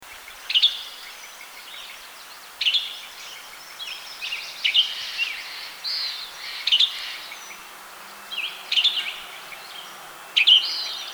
Western Tanager, Piranga ludoviciana; cardinals tanagers grosbeaks

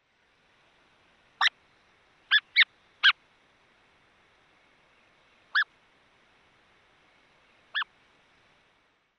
Buff-breasted Sandpiper, Calidris subruficollis; shorebirds rails

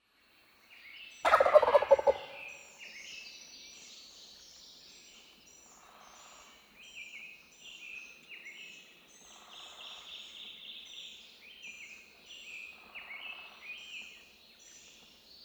Wild Turkey, Meleagris gallopavo; gamebirds

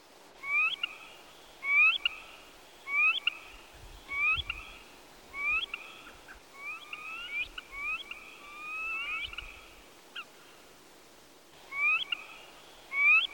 Eared Grebe Podiceps nigricollis; waterfowl

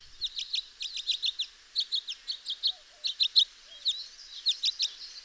House Finch, Haemorhous mexicanus; finches

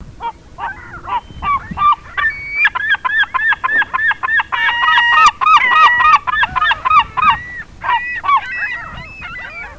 Herring Gull, Larus smithsonianus; gulls terns

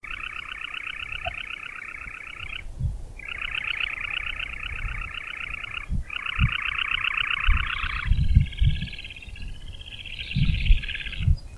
Semipalmated Sandpiper, Calidris pusilla; shorebirds rails

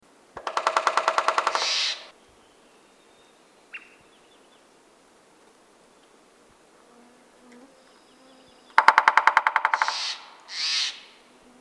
American Three-toed Woodpecker, Picoides dorsalis; woodpeckers

